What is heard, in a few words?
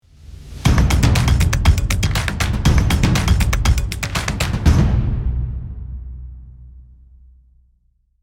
Hype percussion ident logo podcast; logo animation